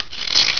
scraper

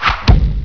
KNIFE, WEAPONS

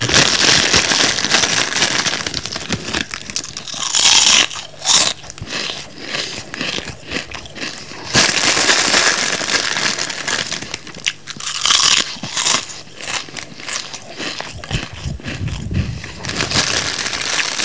CRISPS

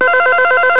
WARBLE LO; ALARMS

